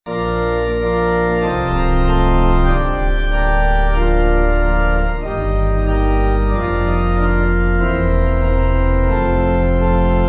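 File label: pipe organ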